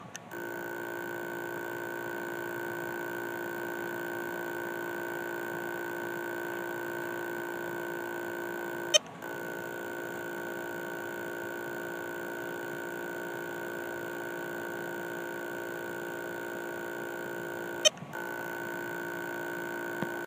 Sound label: Stuck Motor